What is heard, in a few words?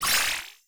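Sound Effect Battle Enemy Info Return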